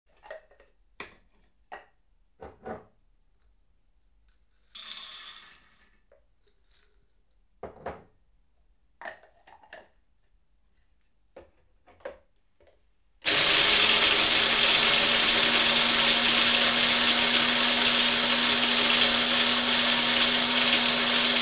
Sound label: Coffee Grinder; Household; BBC Sound Effects Library